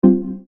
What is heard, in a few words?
alert